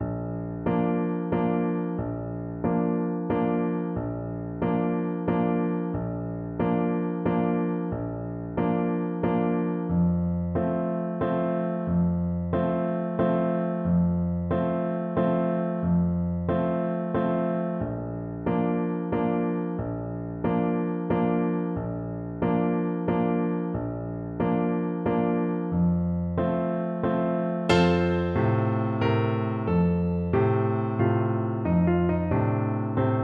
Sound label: tic e tic e toc, Trumpet